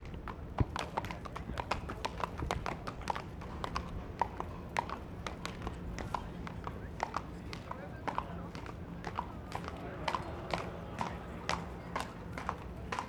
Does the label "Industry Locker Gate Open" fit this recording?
No